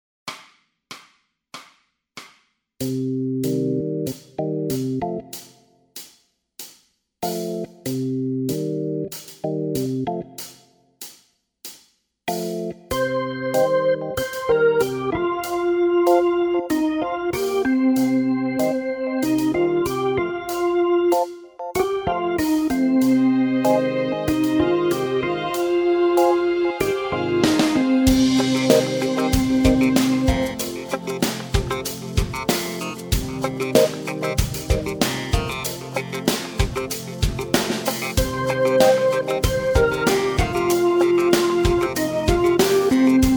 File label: bass blues funk, backing tracks